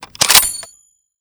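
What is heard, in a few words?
bolt; zatwor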